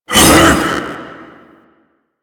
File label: Ape Prelate Attack Short, Vocals; Enemies; Warframe; Proto Infested